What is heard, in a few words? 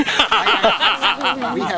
laugh